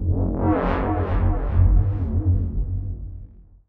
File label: glitch